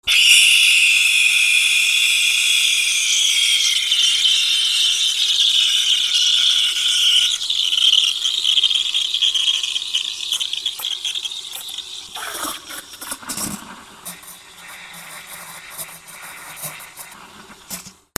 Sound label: KITCHEN